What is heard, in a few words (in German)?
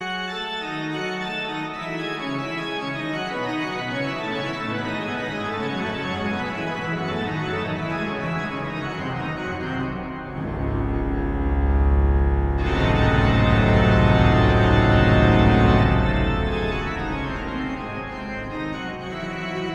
Toccata und Fuge in d-Moll; BWV 565